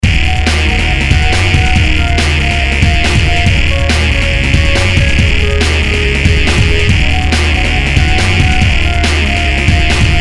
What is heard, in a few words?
xv88 crazy mix